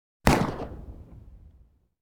gun hand gun; NCH Library

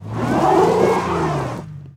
Auto Tires Slide Ice; Automobiles

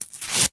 Paper Tear; Office Supplies; 1001 Sound Effects

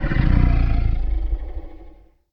MONSTER Growl Deep Long; MONSTERS & CREATURES; mono; Universal Sound FX

